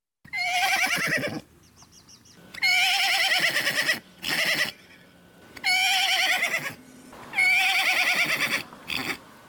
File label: Horse Ringtones